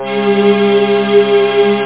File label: chord